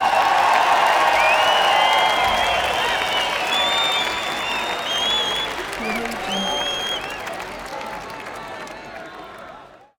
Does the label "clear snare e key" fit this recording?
No